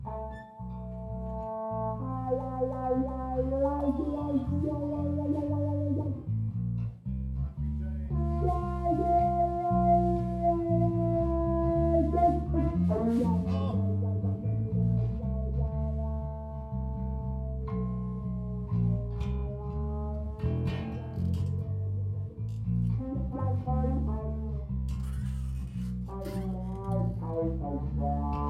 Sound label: Wah Trombone